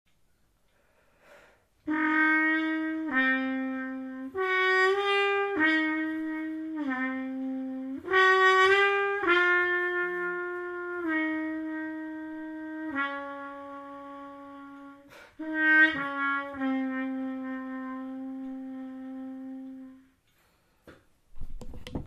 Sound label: Trombone Part; Turnip Head